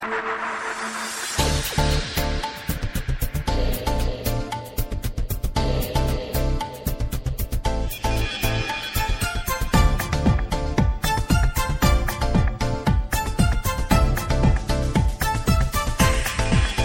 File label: Rocking